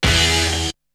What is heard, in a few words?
Guitar; ZERO G - Datafile DISC 2